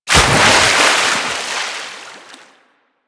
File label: Undertow Splash; Warframe; Warframes; Pirate